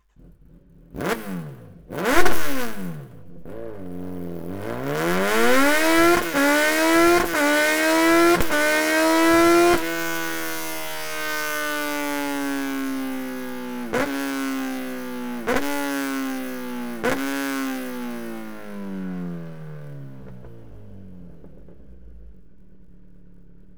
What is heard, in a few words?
exhaust headers kawasaki z900; akrapovic